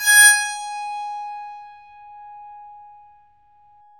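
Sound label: bowed psaltery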